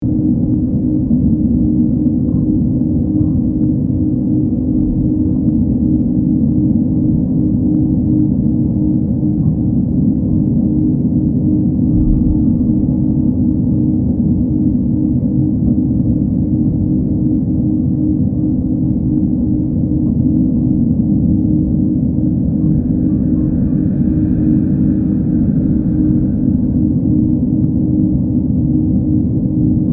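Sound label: Ambience Alien City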